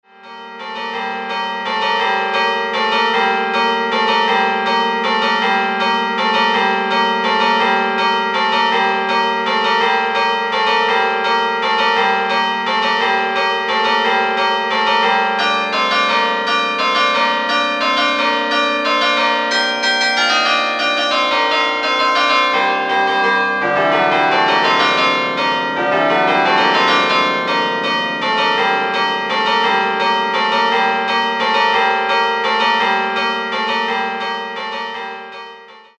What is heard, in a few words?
Carol of the Bells, American Bells